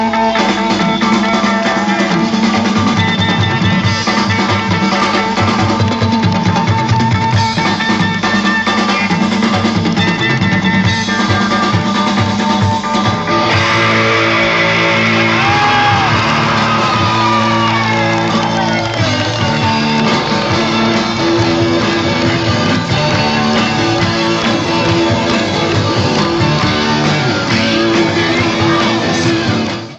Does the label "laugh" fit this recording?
No